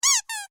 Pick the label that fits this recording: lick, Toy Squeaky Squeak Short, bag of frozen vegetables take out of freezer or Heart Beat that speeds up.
Toy Squeaky Squeak Short